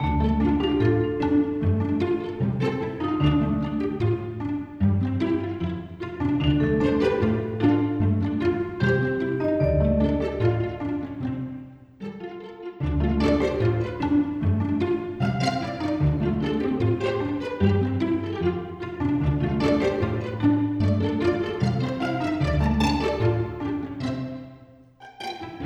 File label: Lighthearted Loop Long; Herolike